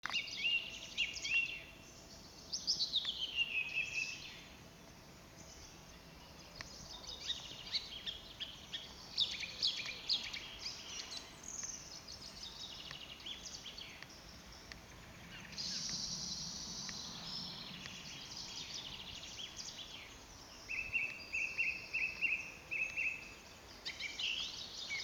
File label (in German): Singdrossel